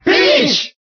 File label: Cheer French; Peach; SSBB